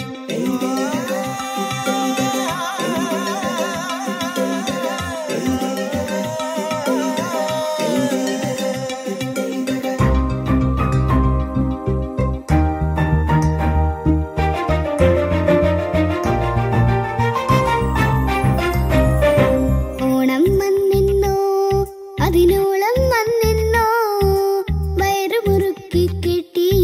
onam song

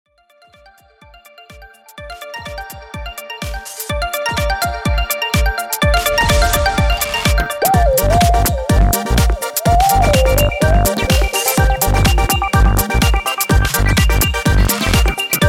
beats ringtone, english ringtones

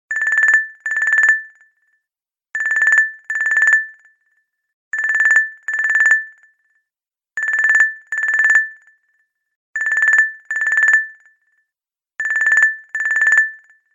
xylophone tone ringtone; huawei

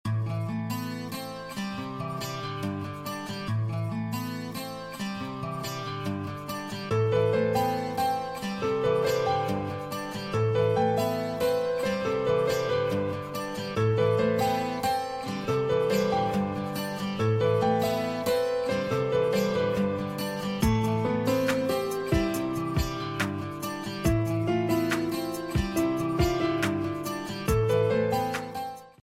sad guitar ringtone

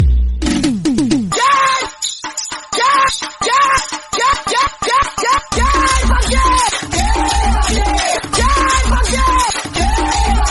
funny music ringtone

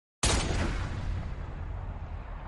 gun shot message tone; sms ringtones

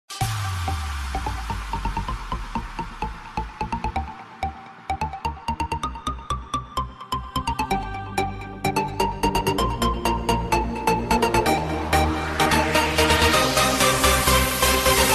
club house ringtone

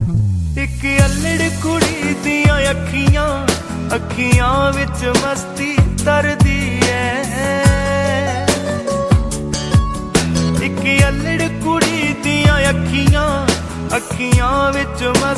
punjabi song ringtone

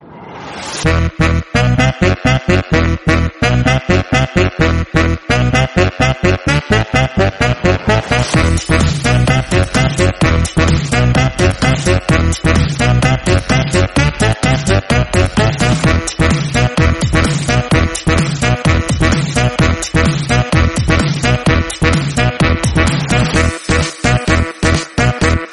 funny musical tone ringtone

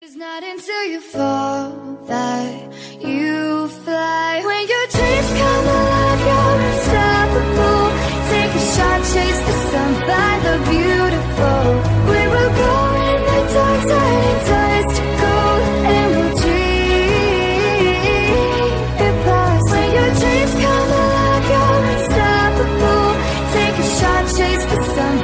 huawei jay ringtone

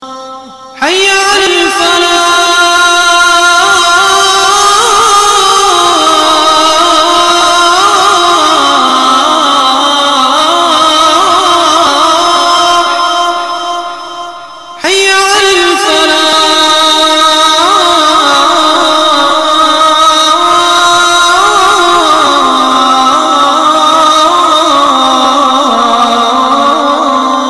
azan ringtone

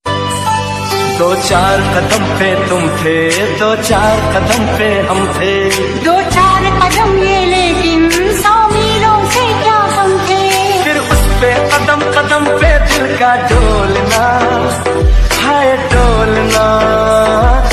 new bollywood ringtone

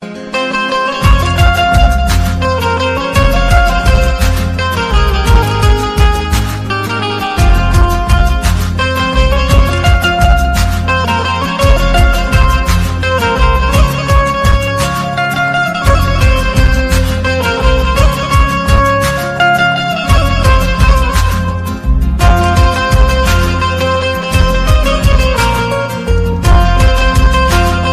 english ringtone; english ringtones

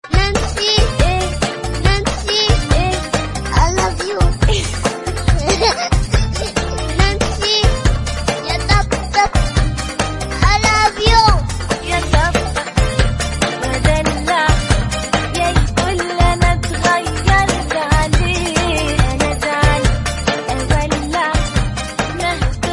arabic ringtone

